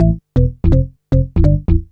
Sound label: bass 125; BASS LOOPS; House; Best Service ProSamples vol.10 - House; AKAI